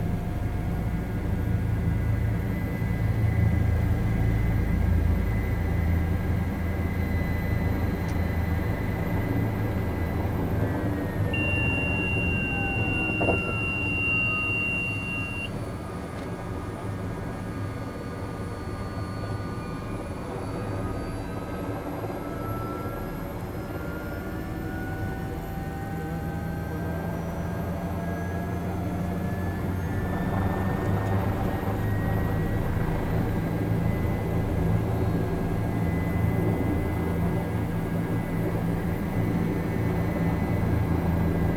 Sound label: Bell 407 Interior engine cutoff autorotation maneuver; ec fields